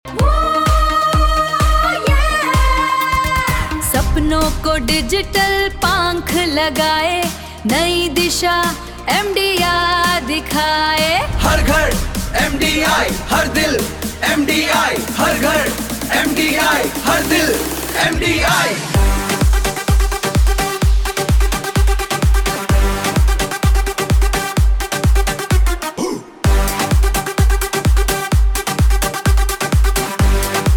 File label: Ringtone